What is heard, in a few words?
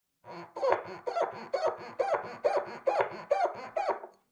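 clean dishes sound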